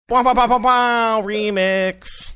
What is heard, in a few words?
making air horn sounds saying remix